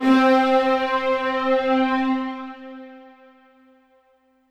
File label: Yamaha EX5 Swell String C4